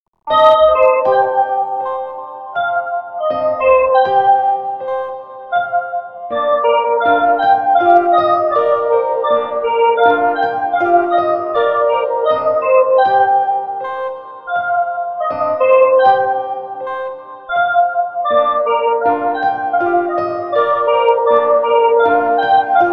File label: Guitar Cover; katawa shoujo